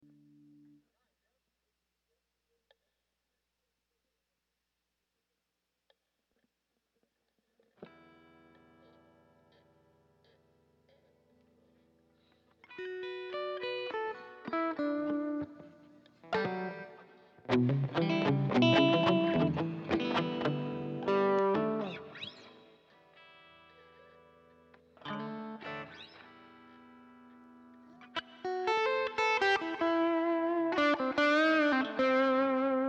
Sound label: Strings Mix